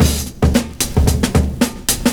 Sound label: 70s Breakbeats; USB Soundscan vol.46; AKAI